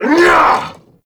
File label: Vocal Attack Short; Warframe; Duviri; Dax